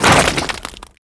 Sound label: corpse explosion